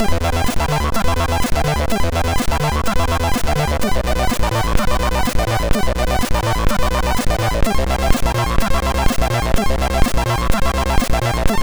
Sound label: Introtune, MOS6581R3